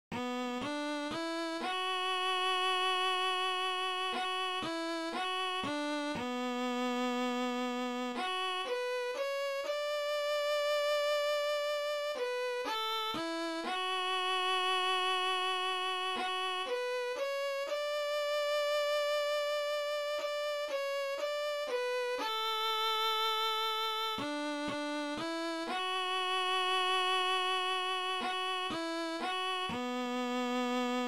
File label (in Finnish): melodia